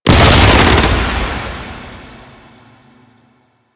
Hyena Bullet Spray Ability, Enemies; Warframe

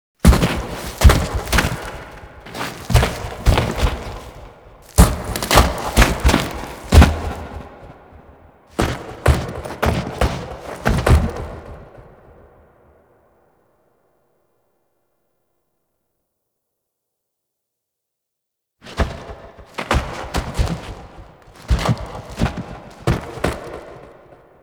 Foley; Warframe; Nineteen Ninety Nine; Quests; Queenpanzee

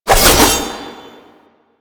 Passive Sickle Spin; Warframe; Warframes; Dagath

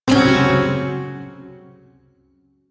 Shawzin Hirajoshi Chord; Warframe; Duviri Shawzin

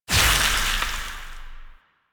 Void Release, Weapons; Duviri; Incarnon Adapters

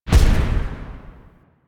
Scythe Projectle Die; DE; Warframe; Weapons; Archwing; Melee; Exalted Archwing Scythe

